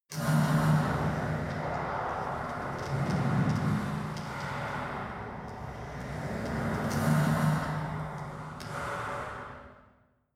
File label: mechanic ambience crackle